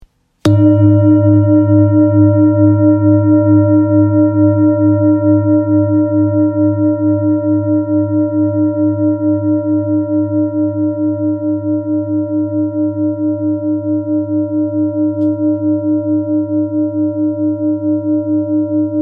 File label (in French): Bol chantant therapeute sol diese